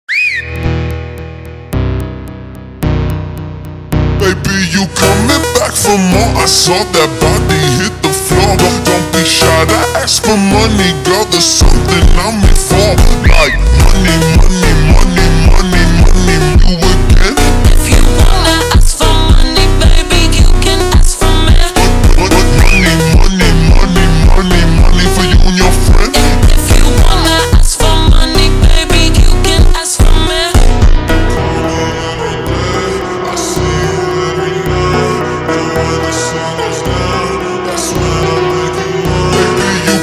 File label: Montagem super Slowed